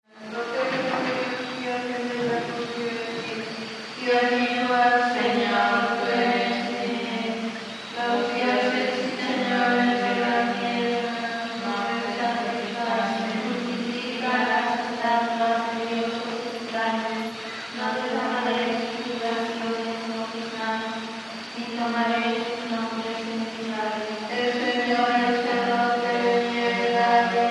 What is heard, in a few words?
Nuns Vespers Crix BG, foreign